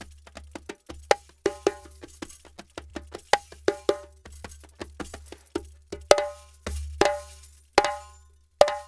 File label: Djembe with Kessing, African; Sonic Foundry (Sony Creative Software); Continental Drift Aftershocks